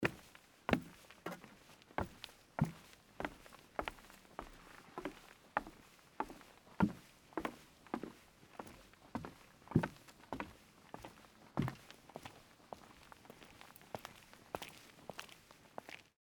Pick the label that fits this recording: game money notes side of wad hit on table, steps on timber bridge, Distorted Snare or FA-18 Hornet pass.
steps on timber bridge